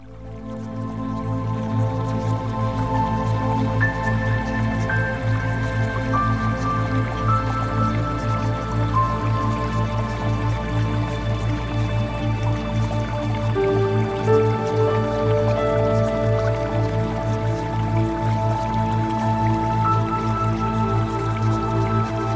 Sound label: Music Theta Waves; Premium Subliminals